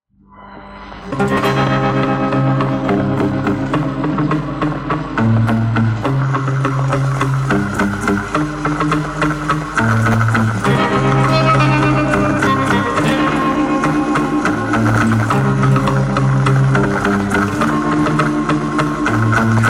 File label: LoFi Surf Song